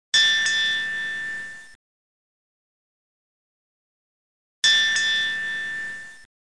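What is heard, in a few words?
boat bell; Microsoft Multimedia Viewer How-To CD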